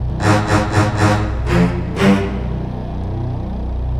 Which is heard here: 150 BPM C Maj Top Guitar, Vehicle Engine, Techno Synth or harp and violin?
Techno Synth